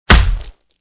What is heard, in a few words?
punch